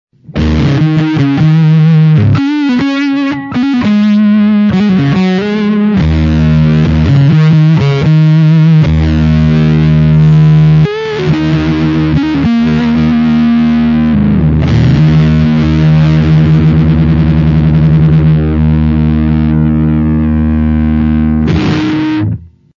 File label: vutron filt